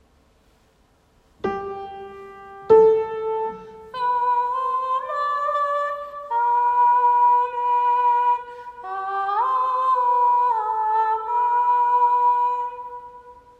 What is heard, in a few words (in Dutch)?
Amen stem 4